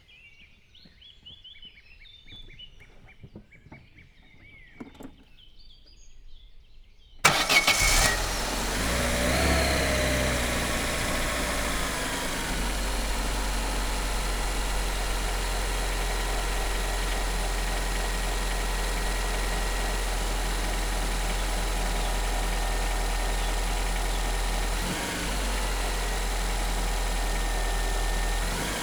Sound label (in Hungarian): motor indítása felnyitott motorháztető; vízház; autó opel; cardioid